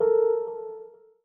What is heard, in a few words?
error; Linux; zenwalk; gdm